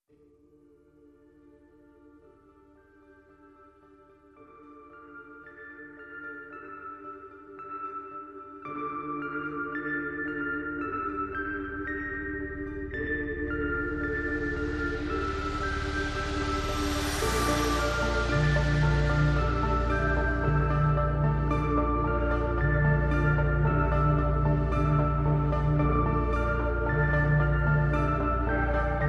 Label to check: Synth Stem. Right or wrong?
Right